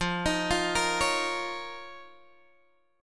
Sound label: F 7th Suspended 4th Sharp 5th F down Guitar Open G